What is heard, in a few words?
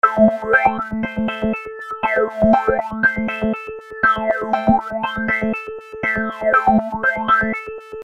wurlie